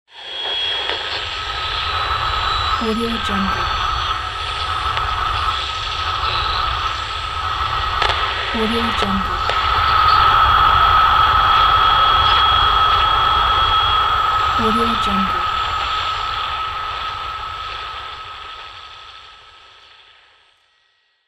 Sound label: radio tuning sound